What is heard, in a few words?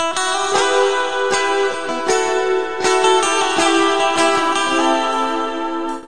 bright leady guitar; GUITAR LOOPS